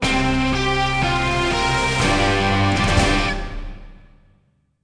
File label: Sting Ui Boss Defeated